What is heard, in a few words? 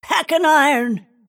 move pain; snapfire